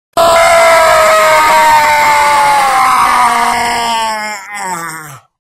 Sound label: crazy screaming sound effect